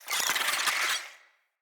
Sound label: Infested Ashen Vocal Attack